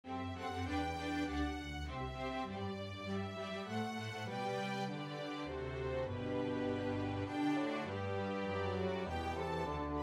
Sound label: Bassoon Track with Melody; The First Nowell